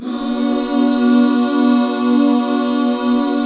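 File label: B minor; Best Service Gigapack 1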